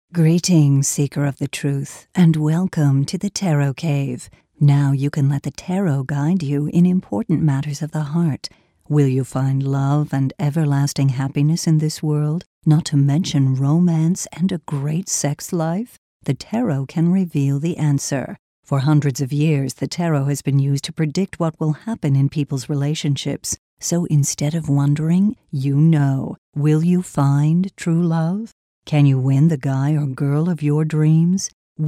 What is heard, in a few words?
romantic